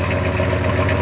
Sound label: engine loop; Premiere CD-ROM Sampler; Time Warner Interactive Group